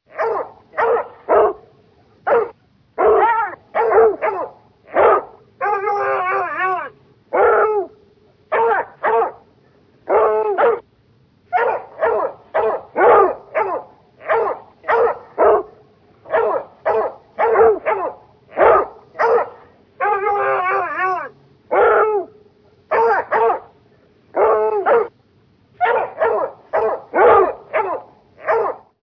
Hound dog bark

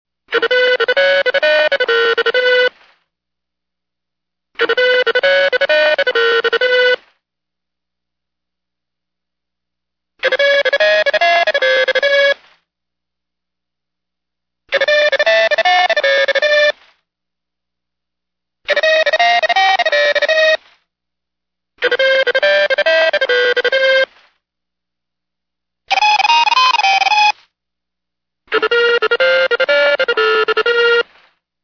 Computer tones; computers

